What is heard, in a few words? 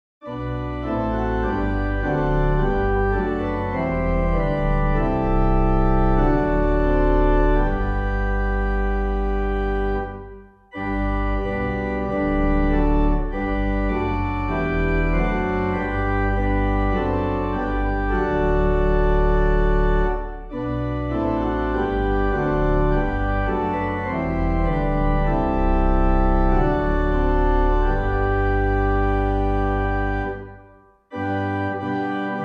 organ; As With Gladness